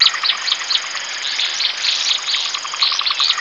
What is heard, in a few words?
SWAMP, HOTLANDS; Zero-G Ambient 1